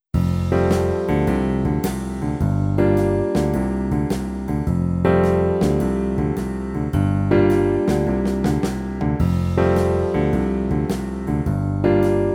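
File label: Shrimp Shuffle Performance, recorder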